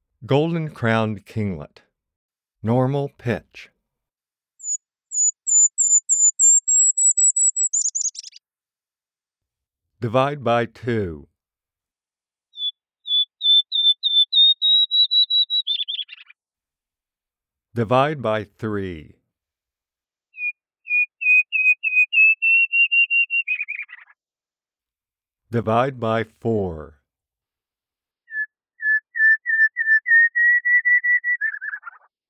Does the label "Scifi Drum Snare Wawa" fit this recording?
No